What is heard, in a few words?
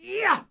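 Knight Attack Vox; Civ4 beyond sword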